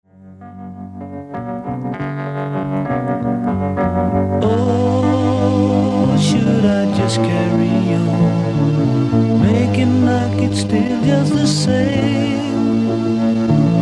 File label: sample chorus